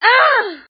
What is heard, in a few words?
ah pain; ooh ahh